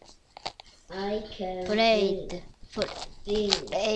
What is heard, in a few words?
crowd